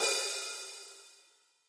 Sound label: hi hat 14 inch open; soundscrate